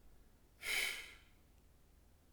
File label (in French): soupir; Hadjithomas Joreige